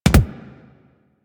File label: Weapon Punch Double